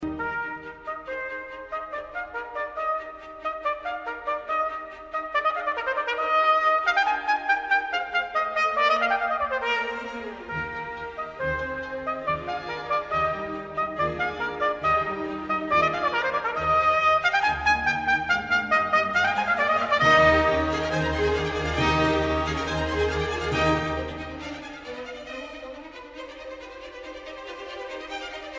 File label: trumpet concerto e flat major; haydn